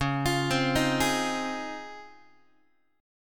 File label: Db Major 9th, Guitar; x,9,8,10,7,7; 4A,4E,5A,5D,5G#,6C#; arpeggiated; chord shape